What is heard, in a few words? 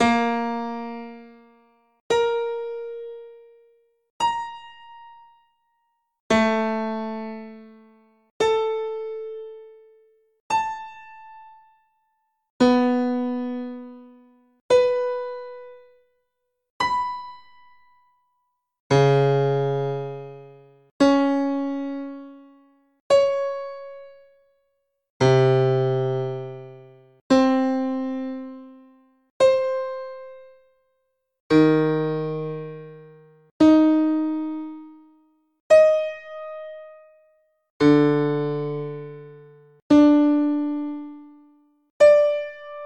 pluck, instruments; fischinger